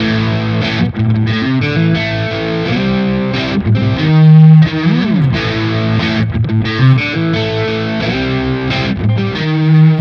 Harley Benton bridge crunch

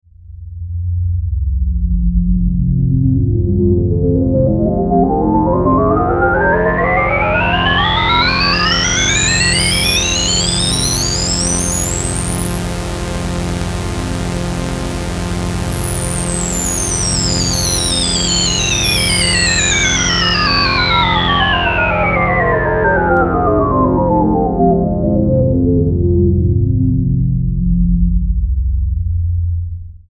filter sweep

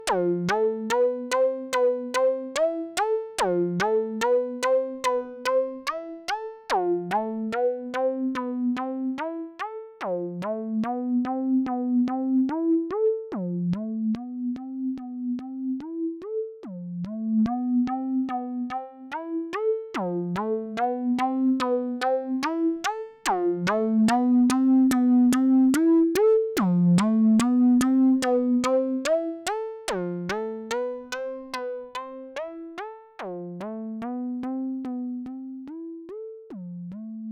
cre8audio West Pest Sequence

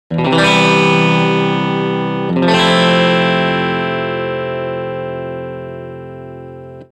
Pop Brit

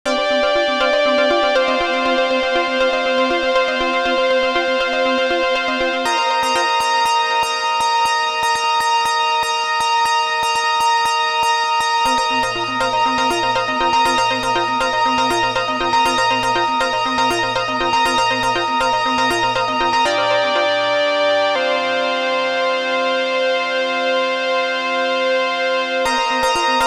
Epic Spacig

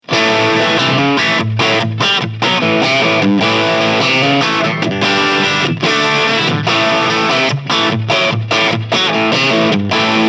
Cranberry Overdrive JCM 800 High Input; One Control

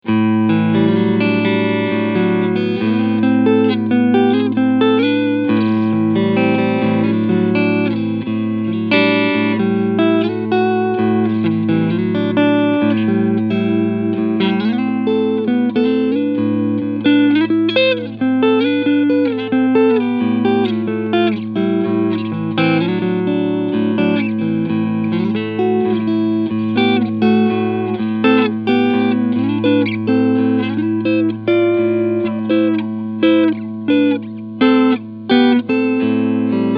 S7 Vintage 3TS Neck Pickup; Larry Carlton

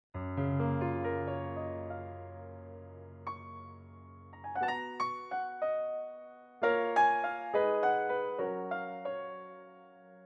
Electro Italian Grand XL

